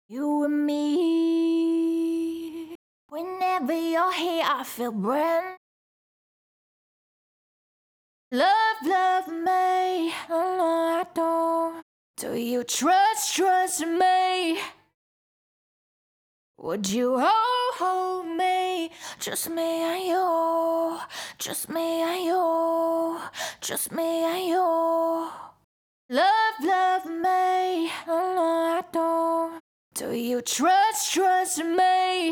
CLEAN DUP R (108 BPM Eminor), Pop Vocals Acapellas; JUST ME AND YOU